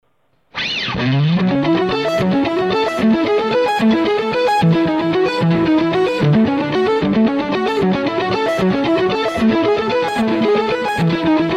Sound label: Guitar